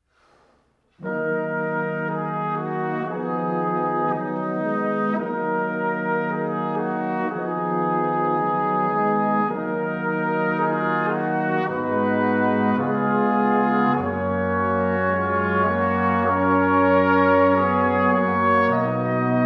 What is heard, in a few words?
Brass Sextet In E Flat Major, Andante cantabile